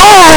scream; alien syndrome

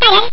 alien; arcade smash hits

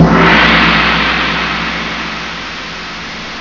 gong; gg portrait; yuuki akira

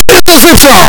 football interception; sports pad football

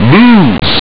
lose; street fighter ii

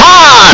baseball foul; nomos world series baseball

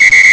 mahjong whistle; taisen mahjong haopai 2

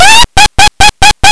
witch laugh; captain silver

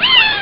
miaow; neko dai suki

